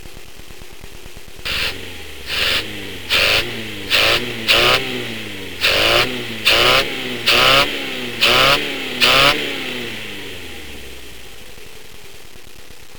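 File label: advanced revving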